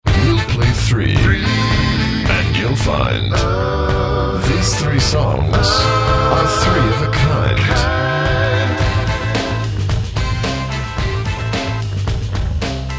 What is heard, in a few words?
intro, Rhythm Section